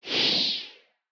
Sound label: Motion Design Woosh; SoundsCrate